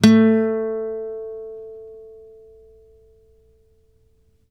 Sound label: ACUSTC A 2, ACOUSTIC guitar; East West; Steve Stevens Guitar Samples Collection; ACOUSTIC PCK